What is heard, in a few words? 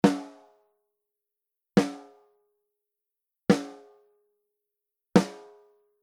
straight, snare drum; Shelford-Channel; preamps